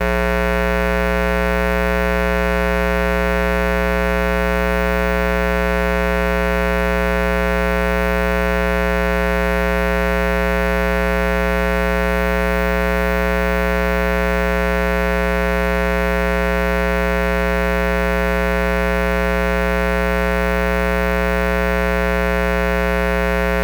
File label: Electrical Box In Closet; Electronics Of My Great Grandma's House